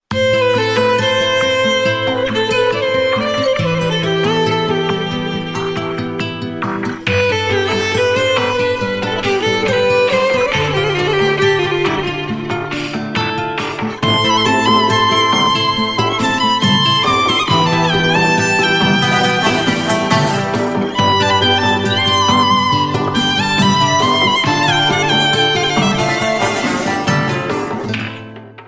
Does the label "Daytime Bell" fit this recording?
No